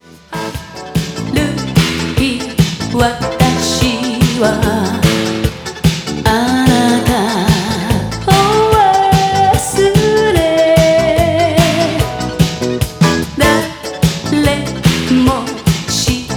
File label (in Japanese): kayoukyoku